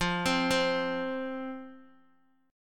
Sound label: F 5th F, down, Guitar; F5 arpeggiated